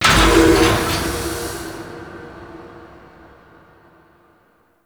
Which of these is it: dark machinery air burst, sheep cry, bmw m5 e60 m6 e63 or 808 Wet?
dark machinery air burst